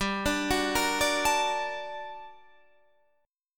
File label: G Minor Major 9th, guitar chord